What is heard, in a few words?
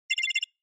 Cyber